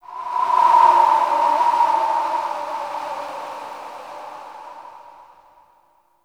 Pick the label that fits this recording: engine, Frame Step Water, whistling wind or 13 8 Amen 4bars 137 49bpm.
whistling wind